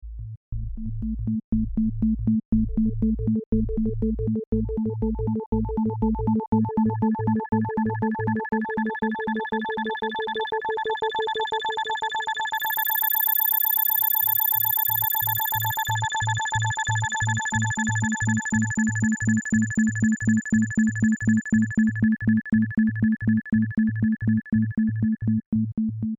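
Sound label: Isochronic Tones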